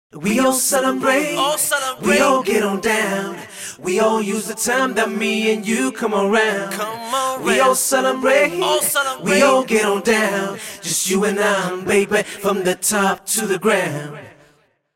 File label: chorus